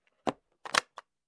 Suitcase opening

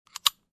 Aircraft Seatbelt lock click sound

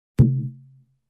Low percussion hit

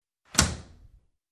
Door Close Slam

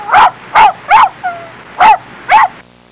Puppy bark and pause

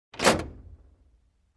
Locking cabinet

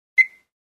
Device Beep

